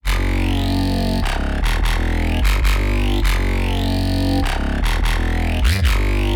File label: Trap